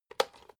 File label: electric whisk insert hook short; haus-garten; kueche